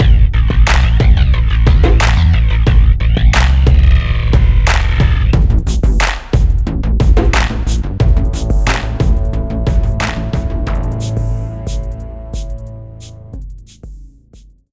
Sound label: BGM Dialogue Grave; The Next Penelope